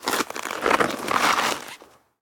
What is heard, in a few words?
ice scuff; Golden Crayon Sounds